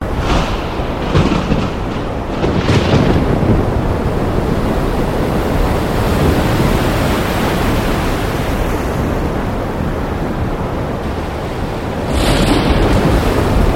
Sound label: rain